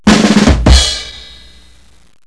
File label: rimshot; hofclient